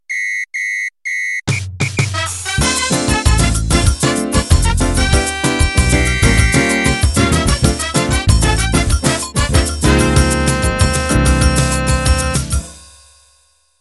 Samba De Sol Intro

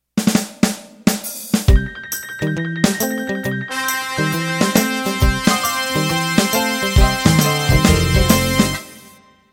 50s Rock Intro

